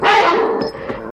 large dog barking aggressive

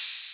clear open hat one shot a key

